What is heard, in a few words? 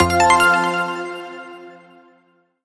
multiline win; Magic Stars 5; Wazdan